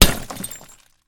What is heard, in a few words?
crack